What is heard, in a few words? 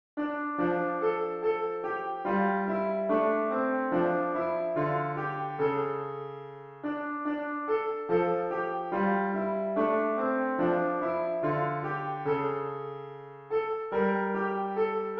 god rest ye merry gentlemen piano